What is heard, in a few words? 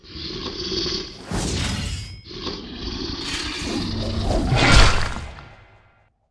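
bugshark trap attack; monster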